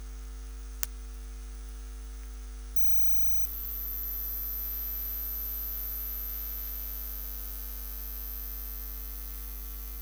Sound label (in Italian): disturbo cantiere; lowfreq